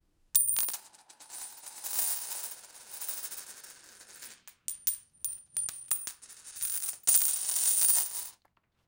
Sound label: coin in bag; loose